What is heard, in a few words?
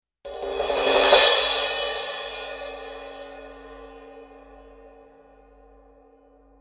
sabian 16 aa rock crash